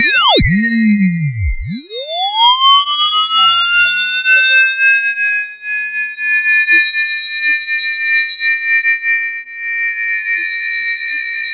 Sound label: YAMAHA CS80 RING, Vintage Keyboards; Big Fish Audio - First Call Vintage Keyboards